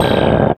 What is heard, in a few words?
RADIO, 160 BPM; Ueberschall; Drum & Bass Flash Trackz